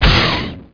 shot hit; MOTS

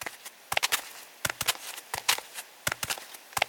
Wolf Run; Keepsake; zak

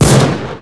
EXPLOS; LOMAX

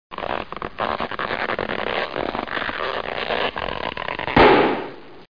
gettin out, POP; DR BRAIN; BUGS

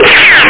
Shooting; TPUG Users Group CD; AMIGA; AMICUS

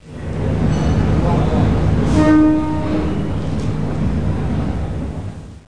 amb

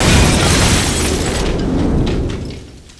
pt boat death; Army Men TiS

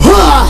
punch; NewWorms

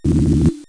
Rotate; Treasure Galaxy (1994); Learning Company

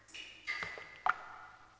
noise

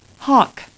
hawk

